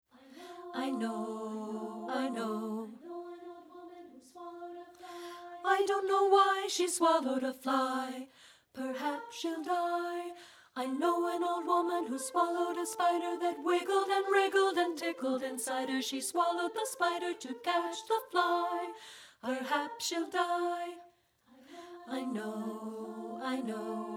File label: alto; SSA